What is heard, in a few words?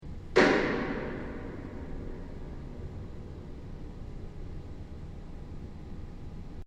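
Piano hit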